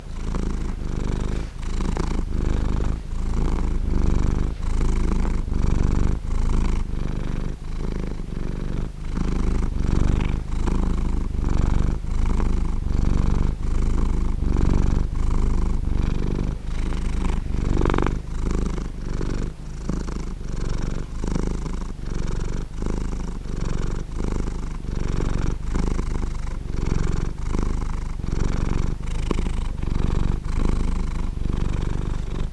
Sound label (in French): son ronronnement chat